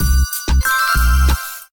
win; Amatic; Casanova

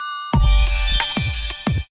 win; Amatic; Casanova

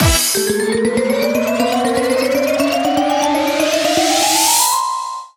stop bonus 2 extended; Amatic; Diamond Cats

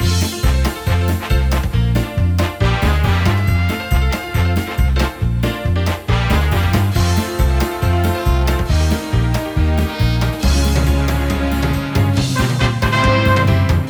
selector melody; Amatic; Billyonaire

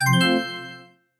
win; Amatic; Book of Fruits

